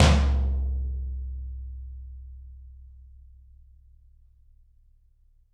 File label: Toms, Drums; Bob Clearmountain Drums I